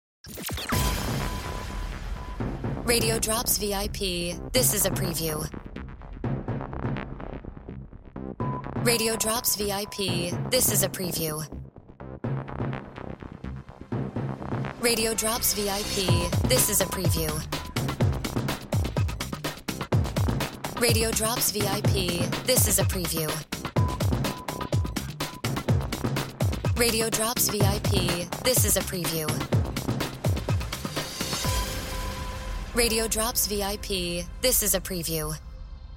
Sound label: Music Beds